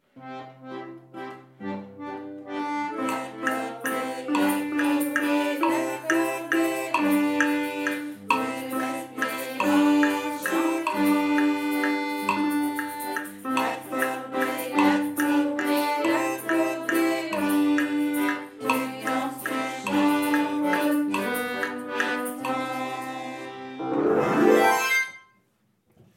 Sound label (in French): Refrain accordéon ensemble